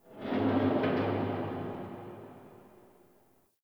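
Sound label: Distant Metal Garbage Wronk; Warframe; Ambience; Nineteen Ninety Nine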